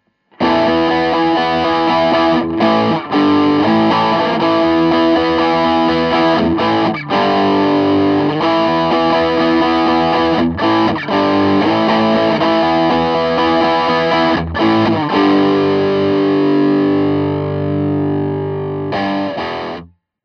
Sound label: crunch